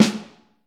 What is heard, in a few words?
Vintage Snare Drum, 3.5X14; Q Up Arts; Johnny.C's Vintage Snare Drum